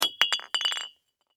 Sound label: Impact Light Bulb Drop No Break Glass; Lights; Household; SFX Bible